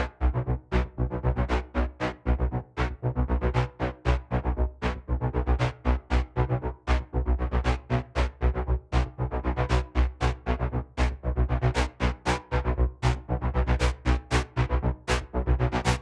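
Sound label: Heavy Blades Synth; Grineer; Grineer One B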